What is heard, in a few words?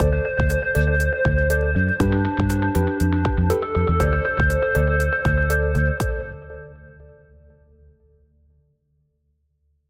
go beat logo; soundscrate